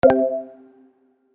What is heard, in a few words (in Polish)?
nowy gracz; UltimateFishing2020